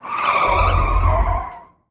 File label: skid GPS; Blind Drive